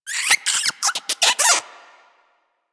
Rodent Lure Call; Warframe; Hunting Critters

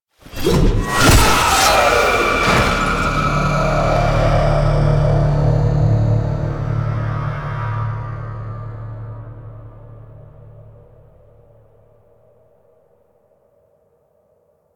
Infested Swarm Finisher Stinger; Warframe; Quests; Entrati Labs

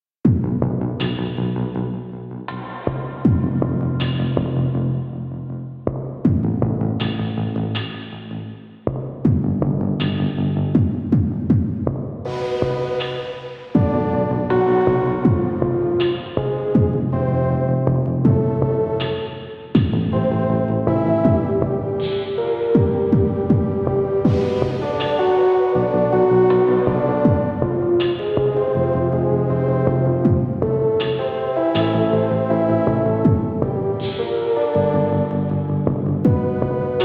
BG Music; Warframe; DE; Night Wave Radio; Nora Night Episodes

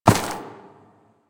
Ram Step Single Grass; Animals; DE; Warframe; Quests; Duviri

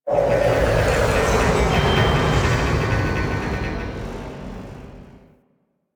Ship Energy Rumble; Ambience; Warframe; Corpus Ship Remaster

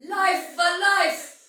Pre Death Soldier Female, Battle; Dialog; Sinecure

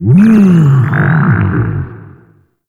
UI Open; Shroom Prince; Warframe; Nokko Colony; Vendors

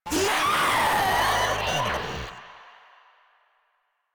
Runner Vocal Attack; Enemies; Warframe; Proto Infested

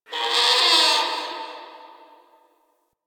interior whiney critter; Ambience; Misc Indoor; DE; Warframe; Infested Eris

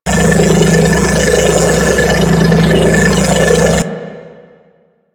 Door Forcefield Loop; Warframe

